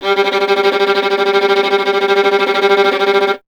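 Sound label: string violin tremolo; Miroslav Vitous; Solo Instruments VOL-2; Solo Inst. II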